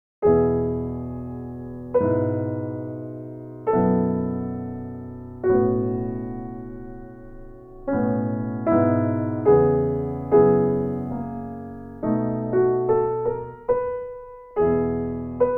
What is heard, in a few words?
Small Piano Song Romantic Piano